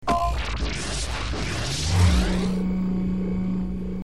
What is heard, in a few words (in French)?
activation bouclier